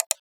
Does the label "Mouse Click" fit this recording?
Yes